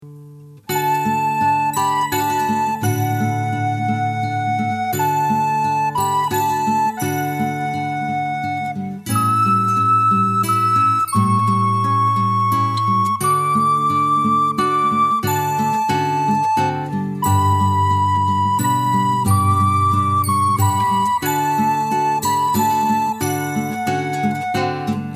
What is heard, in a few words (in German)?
Glocken Stille Nacht; Frohe Weihnachten